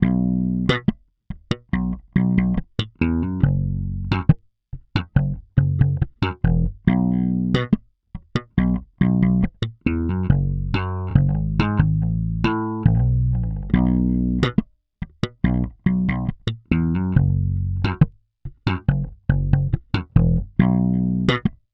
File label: Bass loop 70 F#m, Electric Bass Loops Direct; Slate Digital BASSHOLE Sample Pack